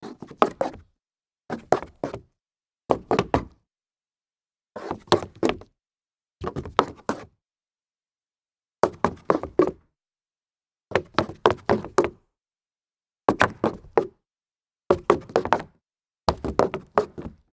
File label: stumble on solid wood, foley